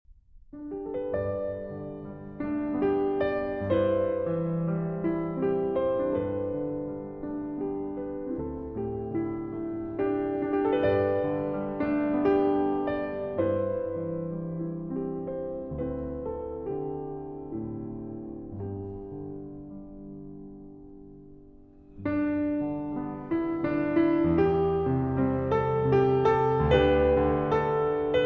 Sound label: Arirang, Arr. for Piano